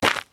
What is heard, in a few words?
Cave Footstep; The Inn